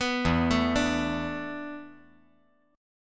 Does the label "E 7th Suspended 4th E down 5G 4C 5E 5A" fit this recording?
Yes